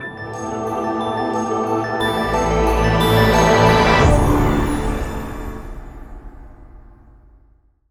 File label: Crystal Jingle; snacko original game soundtrack 2023